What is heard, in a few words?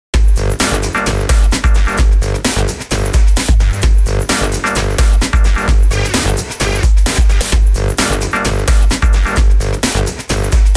breakbeat